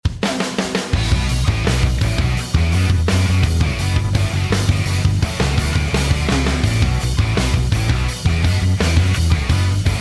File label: Gritty Instrumental; PM USA